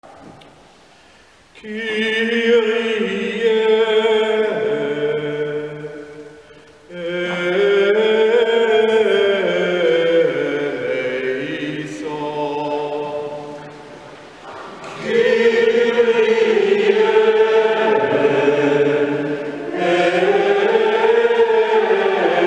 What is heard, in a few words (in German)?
Kyrie Katholischer Kirchenchor Solo; Missa Orbis Factor; Katholischer Kirchenchor Ditzingen; Leitung Thomas Ungerer